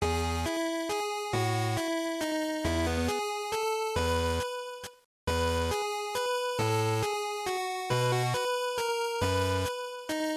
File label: GB RPG Event Loop137